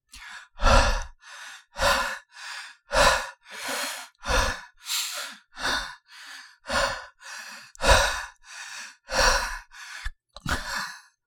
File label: Male vocalization tired heavy breathing gasping